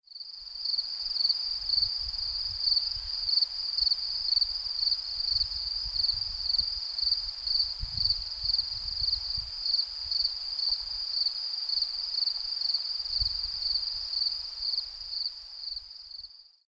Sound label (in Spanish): Chicharras Fuentelespino de Moya